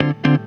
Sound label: TAR GEL, Roots Disco; USB Soundscan vol.32; AKAI